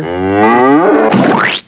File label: cow splat